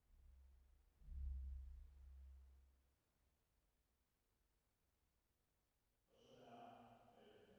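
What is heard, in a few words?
480 Reverb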